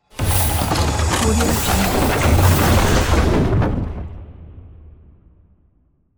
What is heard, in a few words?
tomb opening